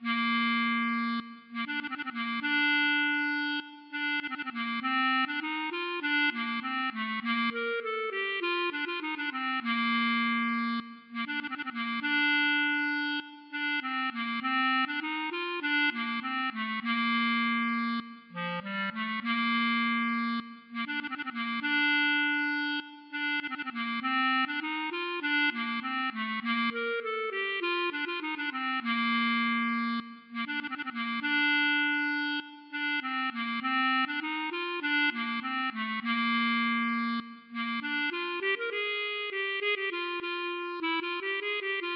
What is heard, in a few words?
klezmer Bulgar